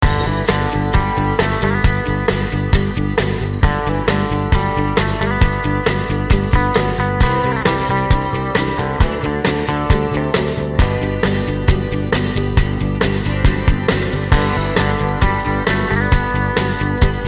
Danish Pop

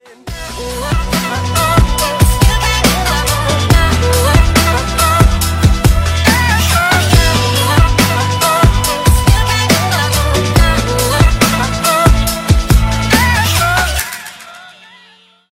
Instrumental

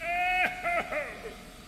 Moan; Zarbon

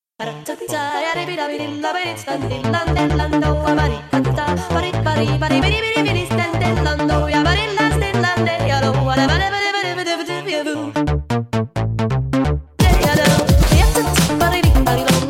Cool Beat

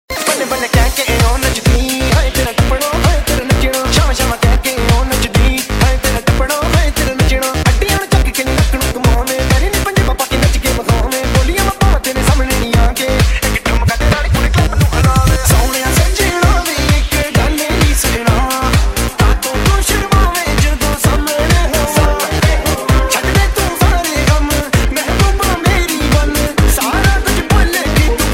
Bhangra Remix

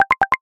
Ping Message

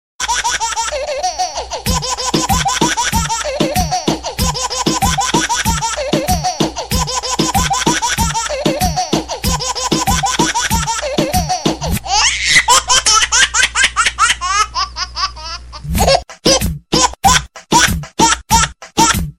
Reggaeton

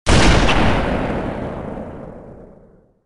sniper; Halo

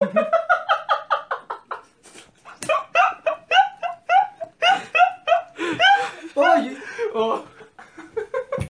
Wind Shield Laugh; Jin

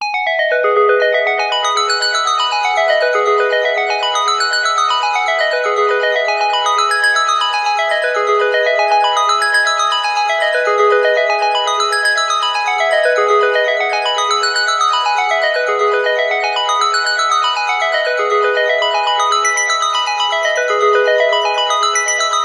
Arpeggio ring

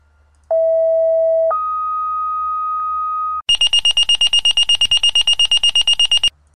Pager

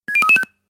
Pager Ring - Notify

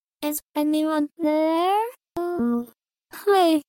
Robot

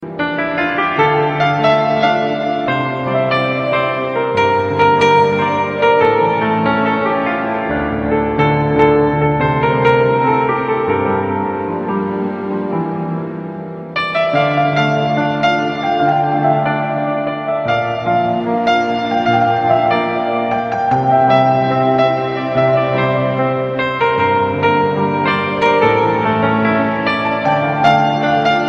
Piano

